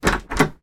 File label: household door open internal wooden; zapsplat